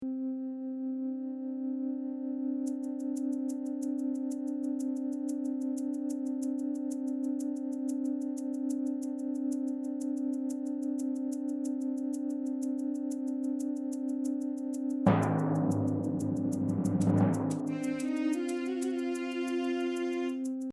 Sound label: Film Score background